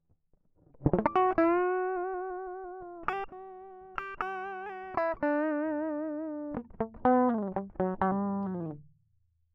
Kyt Solo

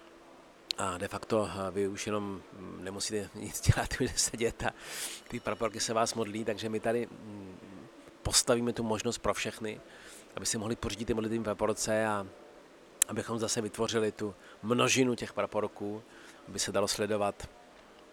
mlaskani spicky

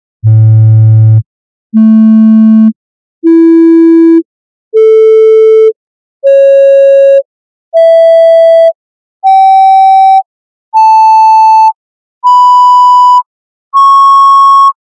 harmonicka rada

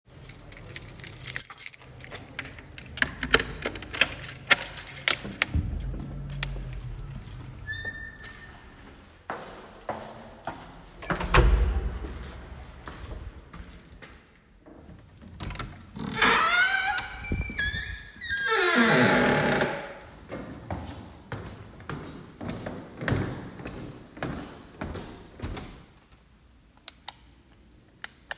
ruch

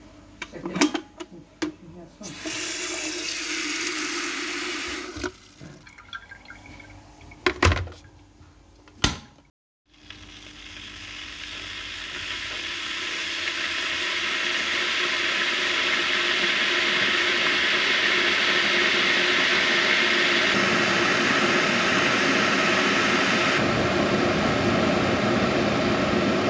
ruch

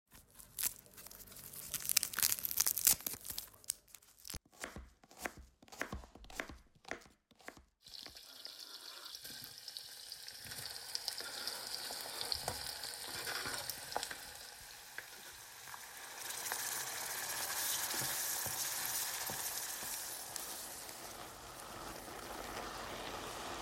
příběh ruchu

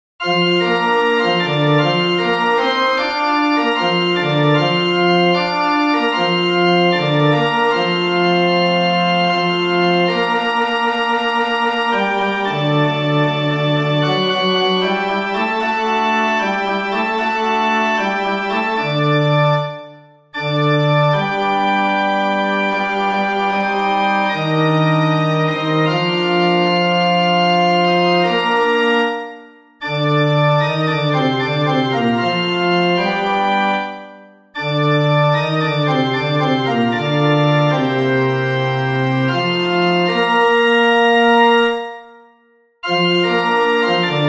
Pivečko bas

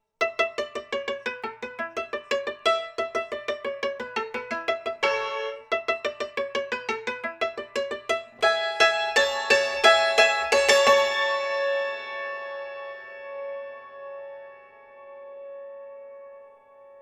Cimbal

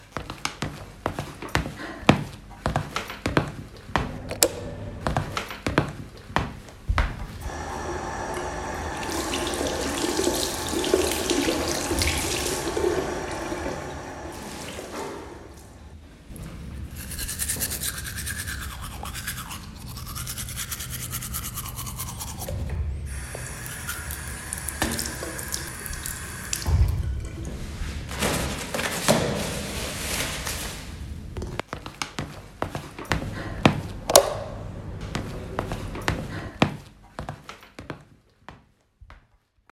ruch

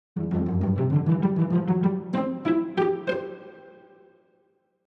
VCLLI pizz

